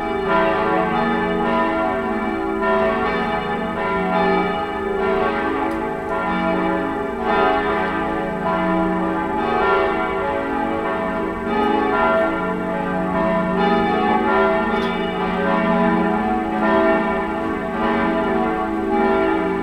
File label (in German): Mannheim Rheinau Versoehnungskirche Glocken